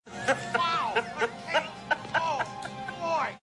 risa malos; robocop

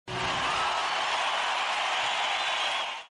multitud rock

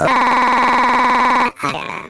lo que no niño grito

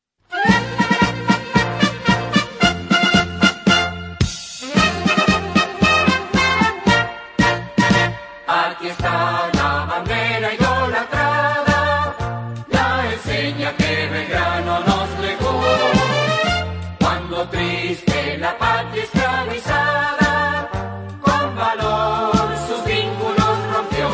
marcha bandera

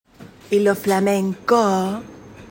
flamencooos

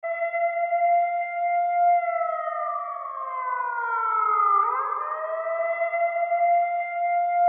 sirena

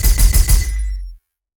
laser rafaga

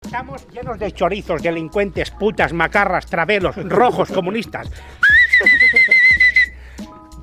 chiflido

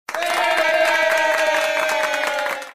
aplausos

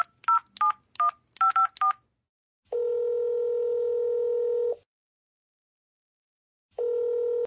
movil marcar

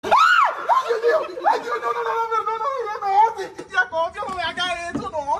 grito

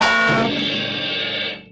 caida dura goofy

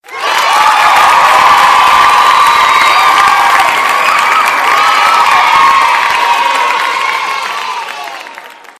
aplausos

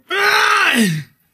haaai grito

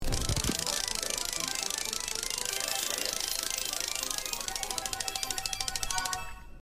ruleta gira

